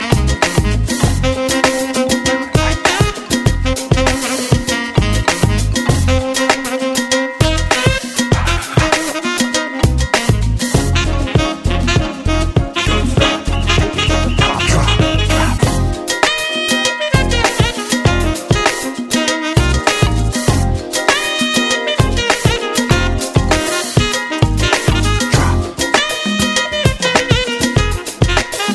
drop

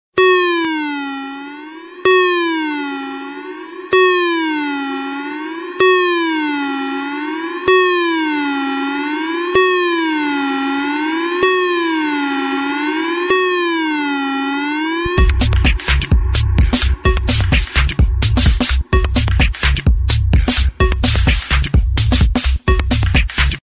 cool musical alarm

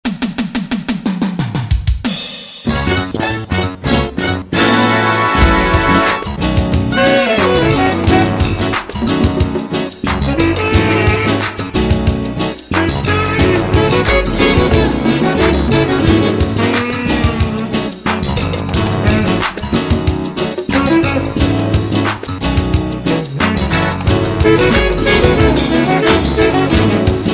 jazz

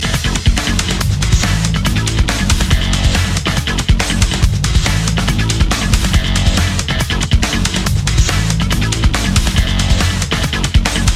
race beats

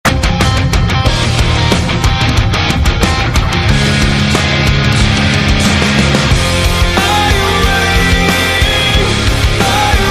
awesome guitar tone

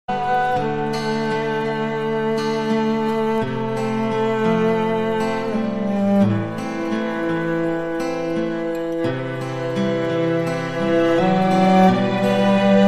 instrumental; zindagi na milegi dobara

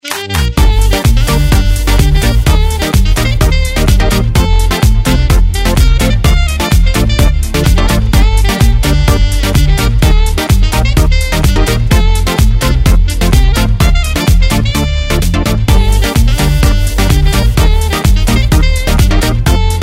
instr

